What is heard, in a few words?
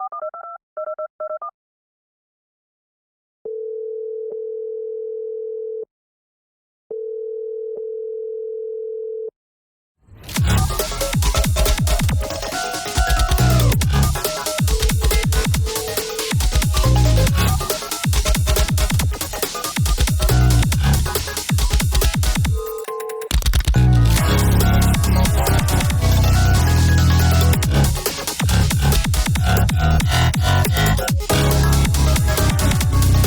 futuristic doodad store hold theme